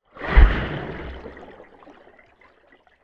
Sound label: nature underwater splash bubbles